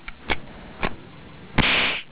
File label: Shot